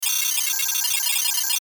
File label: Data Readout Lo Fi, Digital, Buzz, Swell; Production Element, Imaging Element, Accent, Transition; Multimedia; Blastwave